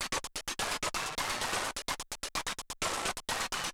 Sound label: perc loop